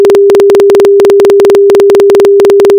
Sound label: signal, BPSK